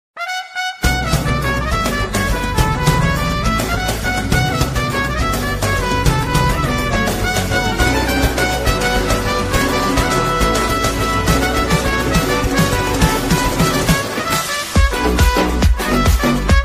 song ringtone bgm; avesham movie

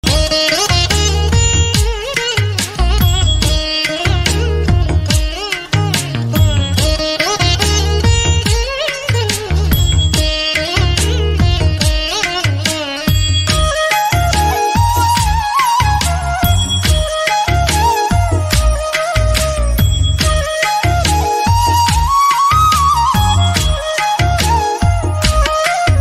instrumental bgm; lord venkateswara saturday morning status

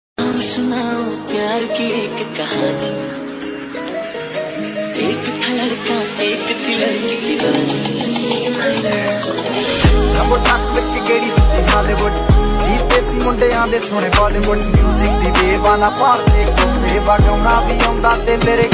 mashup song ringtone

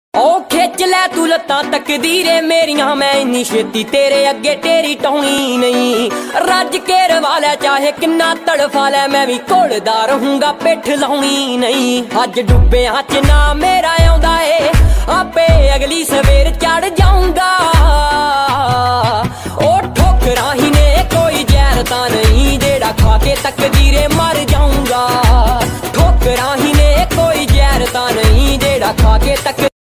punjabi song ringtone

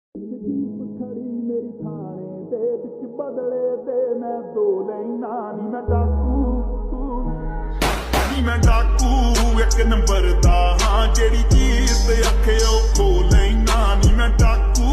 ringtone slowed reverb lofi mix punjabi; instagram viral ringtone

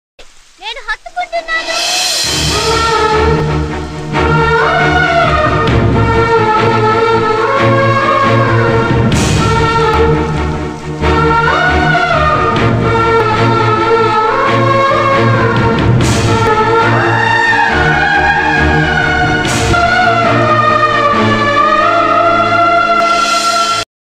bgm; varsham

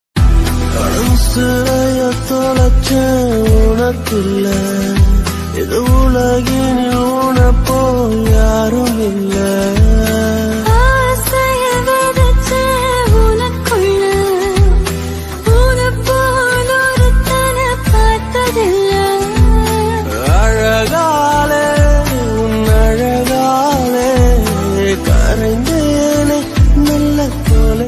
tamil ringtones love feel cut song

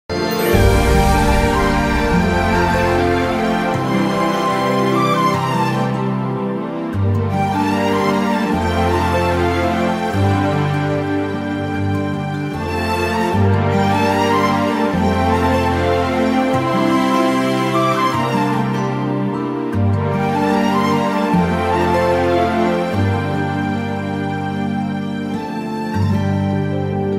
bgm ringtone love ringtone; adipurush

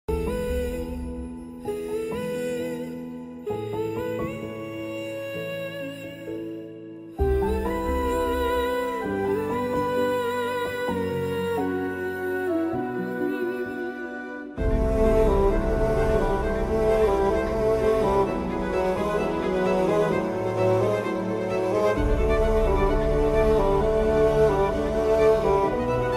broken love bgm ringtone, sad bgm; king of kotha; dulquer salman

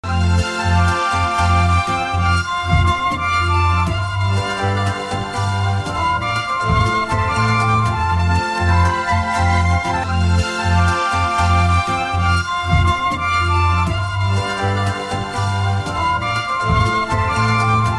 enchanting flute and violin mobile ringtone

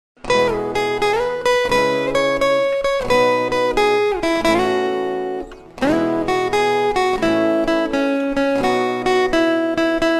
ringtone bhakti instrumental

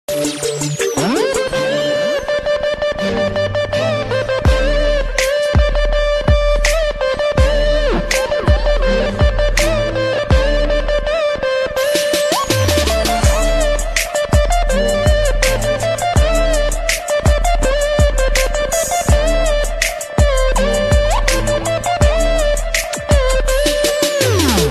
attitude ringtone

